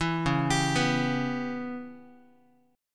Db Minor 7th Flat 5th Db down Guitar; arpeggiated; 7,9,10,9,x,x; 4A,4E,5A,5D,5G#,6C#

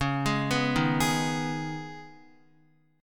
Db 7th Suspended 2nd Db, down, Guitar; JGuitar

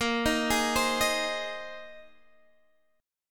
A sharp 9th Suspended 4th A sharp Guitar Open D

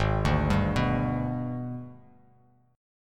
Minor Major 7th Flat 5th; G#mM7b5; arpeggiated; 4,5,5,4

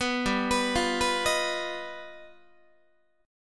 G Augmented 7th, Guitar; G+7 arpeggiated; 14,15,14,15,15,14; JGuitar

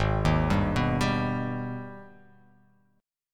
Ab Minor Major 7th, chord; arpeggiated; JGuitar

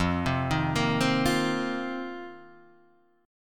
F 7th Suspended 4th F down Guitar Half Step Down

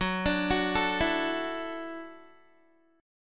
F sharp Minor 7th Sharp 5th, Guitar; F#m7#5 arpeggiated; 14,17,16,14,16,x; 4E,4A,5D,5G,5C,6F; chord shape

